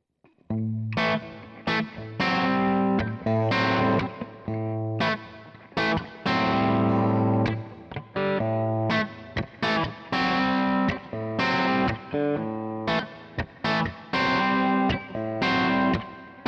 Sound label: Epiphone Les Paul 50s Bridge Crunch